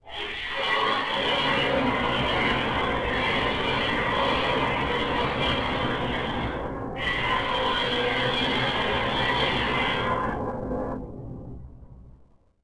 ambient Voices; Ambient Audio; XDK4627 BIOS and XDK Launcher and Dashboard(for Retail); ogxbox

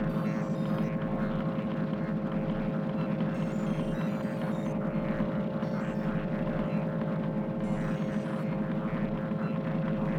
Control Room Loop; Ambient Audio; XDK4627 BIOS and XDK Launcher and Dashboard(for Retail); ogxbox

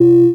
B Button Back; ogxbox; XDK4627 BIOS and XDK Launcher and Dashboard(for Retail)

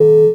A Button Select; ogxbox; XDK4627 BIOS and XDK Launcher and Dashboard(for Retail)

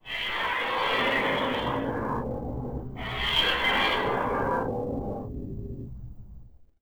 ambient Voices; Ambient Audio; XDK4627 BIOS and XDK Launcher and Dashboard(for Retail); ogxbox

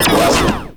Delete Destroy; ogxbox; XDK4627 BIOS and XDK Launcher and Dashboard(for Retail)

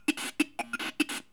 comm static; Ambient Audio; XDK4627 BIOS and XDK Launcher and Dashboard(for Retail); ogxbox

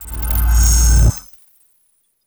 Settings Main Menu Out; XDK4627 BIOS and XDK Launcher and Dashboard(for Retail); ogxbox

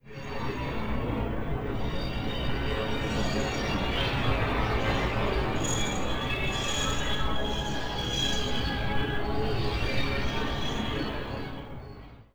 ambient Steam; Ambient Audio; XDK4627 BIOS and XDK Launcher and Dashboard(for Retail); ogxbox

